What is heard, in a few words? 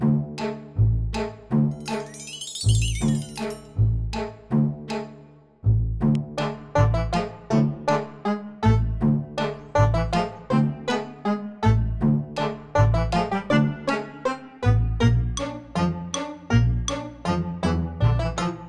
Comic or Childrens Loop Low Intensity